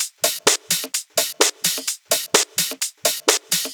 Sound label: House Loop, 128 BPM; Vengeance Samples